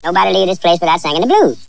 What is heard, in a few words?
sing blues